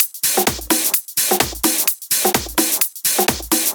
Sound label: Loop, 128 BPM